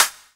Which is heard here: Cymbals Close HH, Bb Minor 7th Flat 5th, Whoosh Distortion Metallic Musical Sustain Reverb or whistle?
Cymbals Close HH